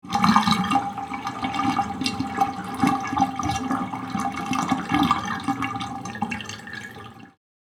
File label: Bathroom sink draining water